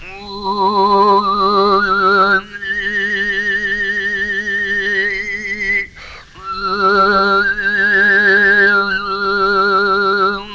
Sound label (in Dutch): tuvazang